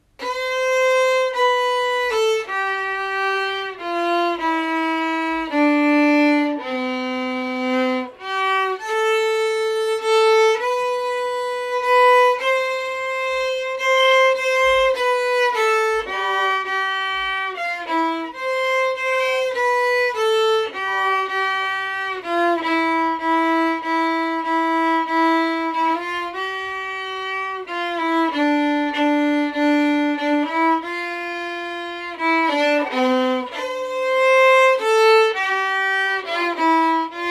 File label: Violin Joy To the World